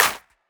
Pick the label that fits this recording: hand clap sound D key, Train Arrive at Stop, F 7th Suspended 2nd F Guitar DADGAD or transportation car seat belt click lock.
hand clap sound D key